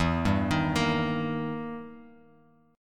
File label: E Minor Major 7th Flat 5th, Guitar, Open F; 4,2,3,5,x,x; arpeggiated; JGuitar